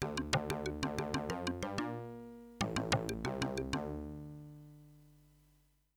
ditty; androsynth

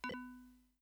pickup; syreen

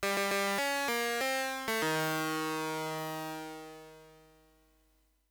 ditty; umgah

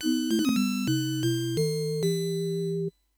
syreen ditty

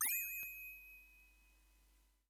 shatter; chenjesu

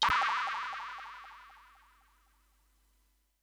mycon regrow

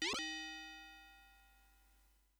bullet; spathi

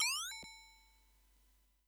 teleport; arilou

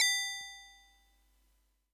boom ship die

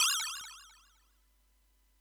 blaze; androsynth

